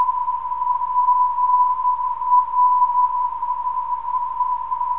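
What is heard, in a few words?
non-directional beacon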